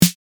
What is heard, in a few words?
Snare 808